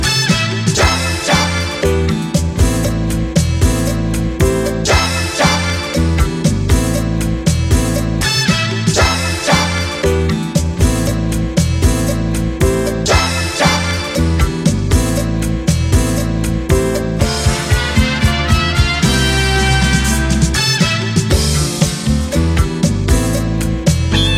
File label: Piano